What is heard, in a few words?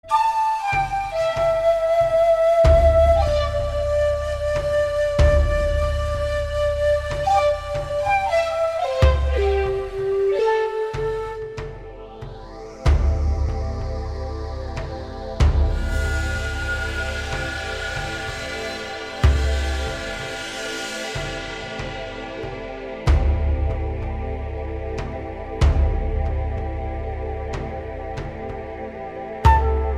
Ambush atmosphere tense changes